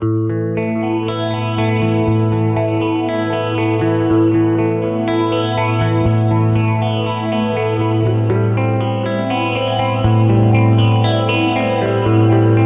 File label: Mooer GE 300